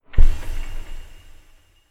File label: Horror Stinger Rituals